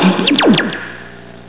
zap

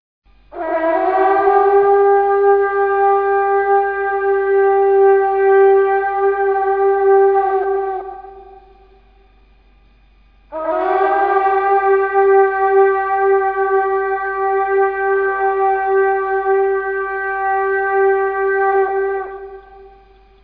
Kangling, Nuns

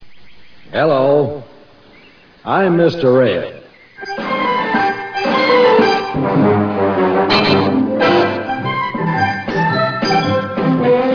music

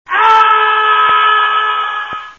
ahhhhh

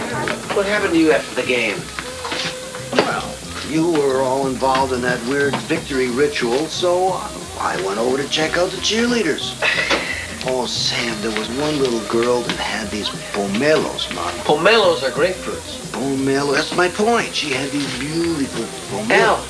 cheerleaders

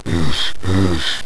ooosh

